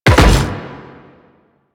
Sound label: Land Metal, Footsteps; Warframe; The New War; Kahl; Quests